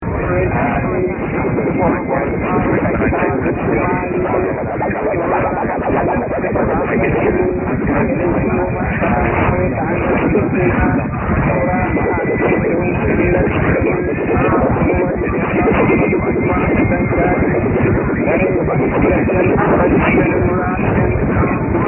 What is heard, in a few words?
930 warble